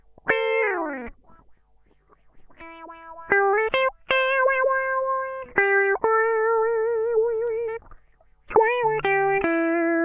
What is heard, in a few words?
Lead LP SH, lp bp hp lfo filter